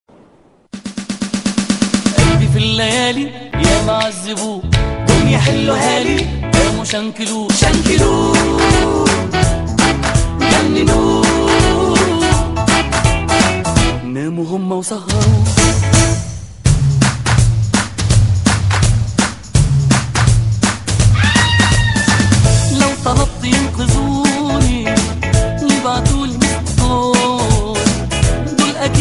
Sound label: RockOriental